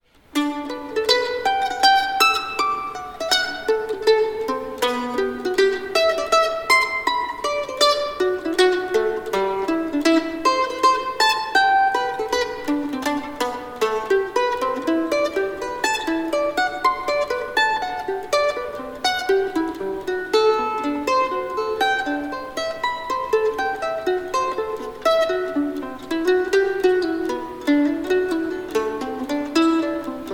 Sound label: cello stretch